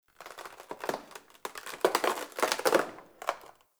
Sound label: Ambience Dead Tree Texture; Mendicant Area